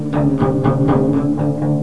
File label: agogbend